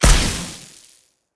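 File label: attack elec ball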